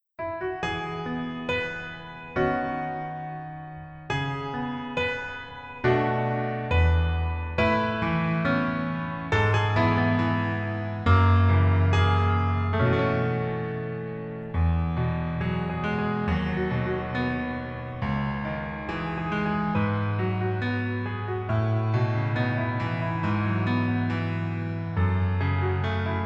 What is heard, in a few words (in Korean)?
Piano ver, 멜로디MR 남자키